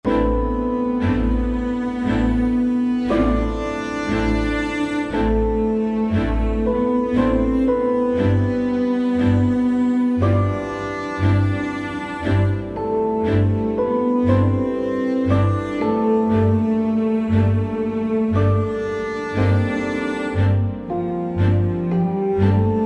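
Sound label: background music Field Chapter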